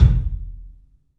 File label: KICK, 3-D Percussives; E-MU Producer Series Vol. 5 – 3-D Audio Collection; P.A. Kit